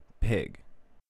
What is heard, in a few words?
Pig